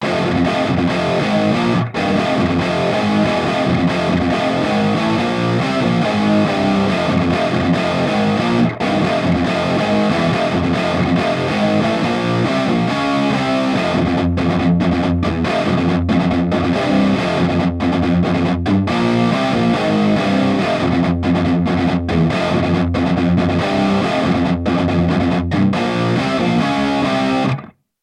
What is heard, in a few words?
chords